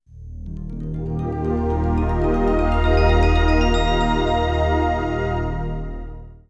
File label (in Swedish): MELODI